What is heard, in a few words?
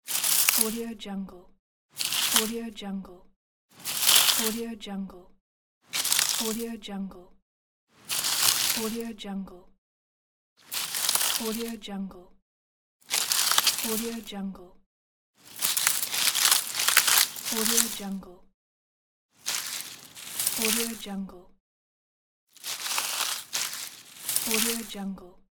gather herbs